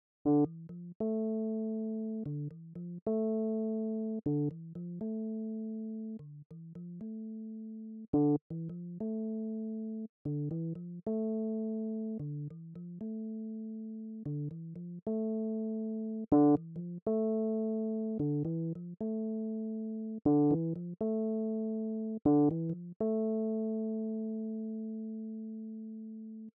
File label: riffy Rhodes